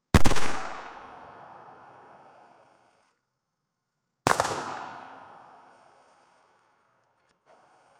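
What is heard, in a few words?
assault rifle gunshot single; firearm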